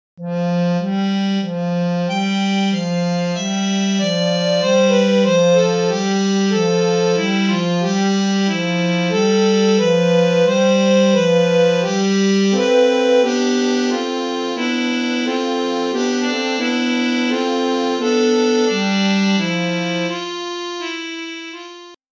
Supertone FX, Reeds

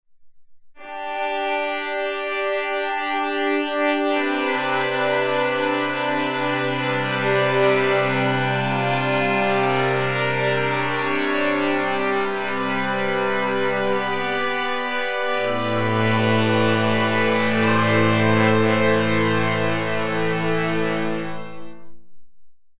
Nebulous Brass; Cosmology

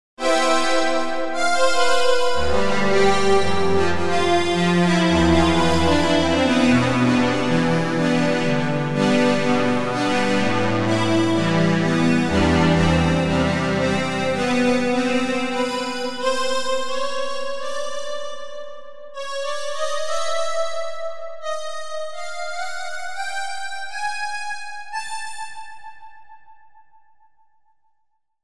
Cosmic Keys; Cosmology

